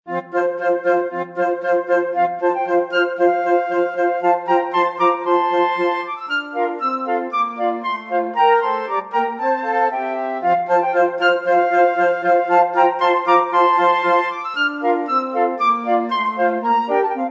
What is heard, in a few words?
Tambourin; Gossec